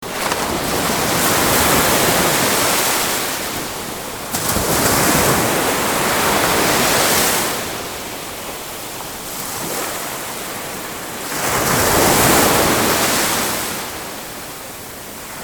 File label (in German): Meeresrauschen; Der Klang des Meeres